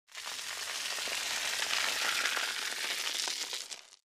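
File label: Sandbag Dragged Across, sand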